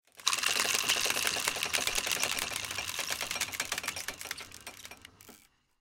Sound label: roulette spin